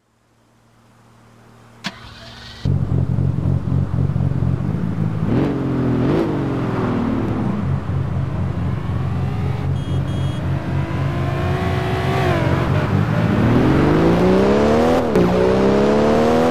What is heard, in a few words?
jaguar xkr; TDU-1